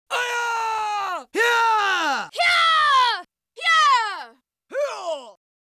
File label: Karate Yells; Saban; MMPR